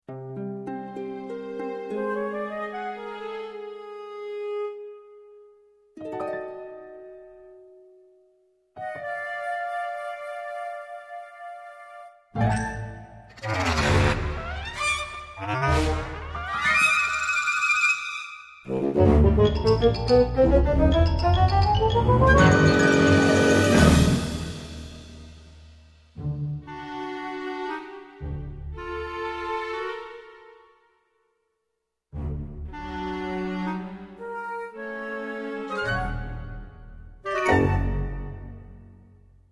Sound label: New Groove